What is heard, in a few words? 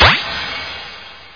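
click of a prankster from a cartoon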